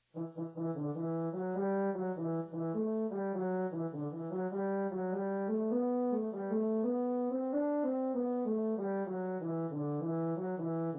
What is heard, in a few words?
soprano; pfautsch